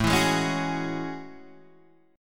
A Major 13th, Guitar DADGAD

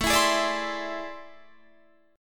A Minor Major 11th, down, Guitar, Standard; strummed; AmM11 Chord; JGuitar

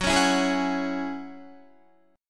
Gb 7th Suspended 2nd Gb Guitar; Gb7sus2; strummed

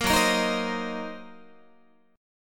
F sharp 6th Flat 5th F sharp down Guitar; F#6b5; 13,14,13,13,16,14; strummed

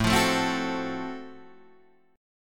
Gb Minor Major 7th Flat 5th, chord

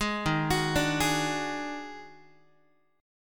Eb Minor Major 7th Double Flat 5th, Guitar; JGuitar

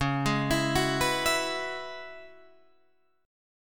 Db Minor 11th, Guitar, Drop D

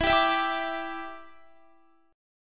E Minor 7th, down, Guitar; Em7; strummed

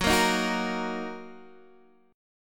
Fsharp 6th down Guitar Standard; strummed; JGuitar

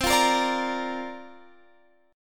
C 7th Suspended 2nd Suspended 4th C down; C7sus2sus4 strummed

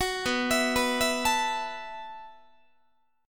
B 7th Suspended 4th B down Guitar; B7sus4; arpeggiated

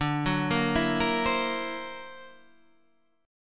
D Augmented 9th, guitar

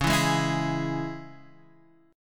Db Minor Db down Guitar Standard; Dbm strummed; JGuitar

